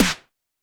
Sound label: TD-20 Tom A, Roland TD-20 Toms